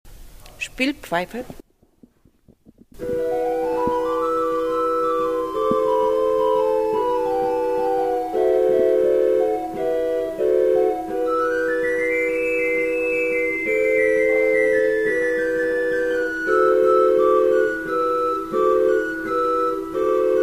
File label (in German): Spielpfeife, Pfeifen; Toene